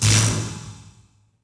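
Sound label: Zap Large, electrical sparks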